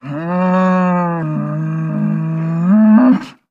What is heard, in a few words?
Cattle, Cow Bellow, Breath. Single Large Bellow With Breath. Close Perspective.